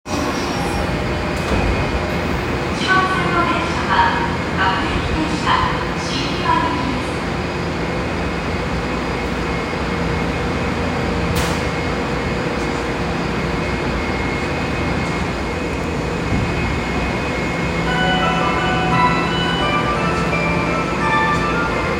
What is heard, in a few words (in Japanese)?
有楽町線麹町東, train sounds